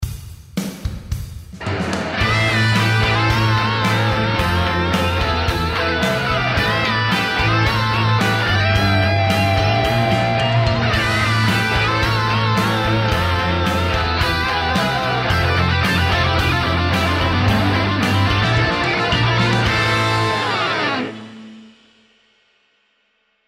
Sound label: 80s sample